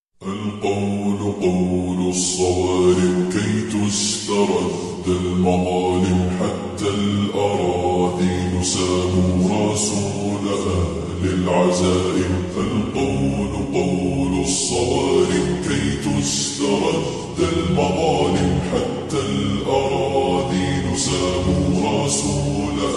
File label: slowed reverb nasheed